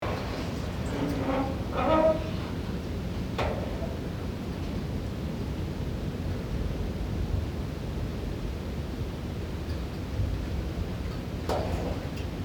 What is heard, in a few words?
Empty barn interior ambience farm